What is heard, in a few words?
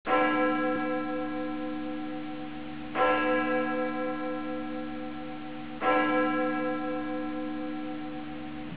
tolling bell